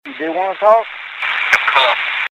ghost box

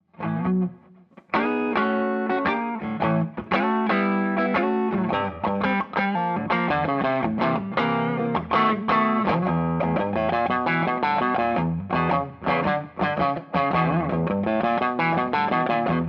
Texas Strat Clean

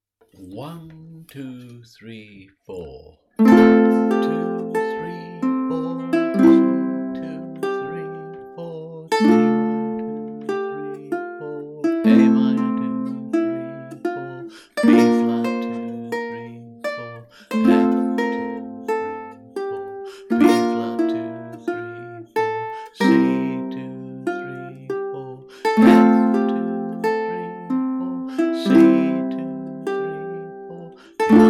Pachelbel - B Harmony

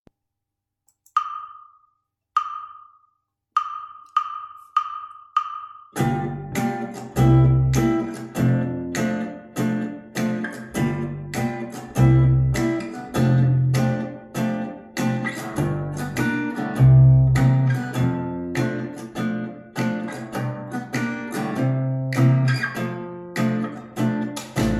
Backing Track

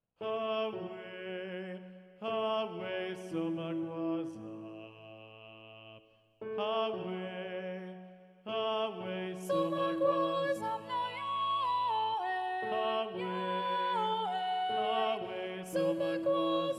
Tenor Predominant; SATB; Somagwaza

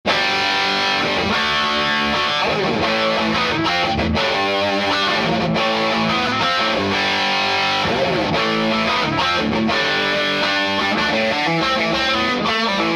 Hard Rock

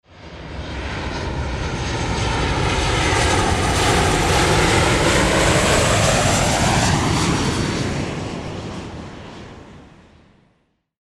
aircraft large airliner fly by

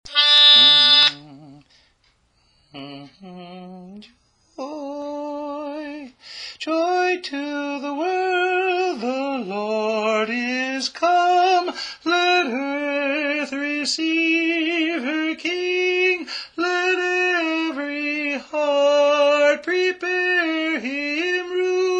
Joy to the World (Tenor)

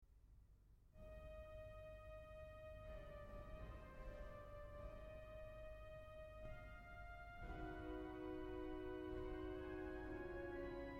Lento expressivo

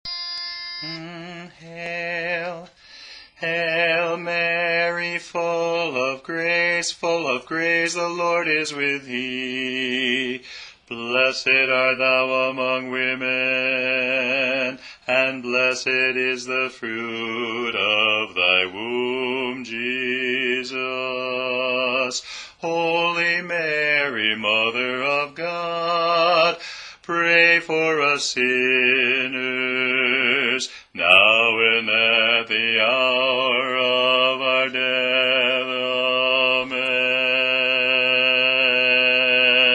Bass; Hail Mary

